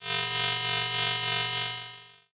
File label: cicada